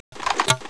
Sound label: m203 in, weapons; hks; valve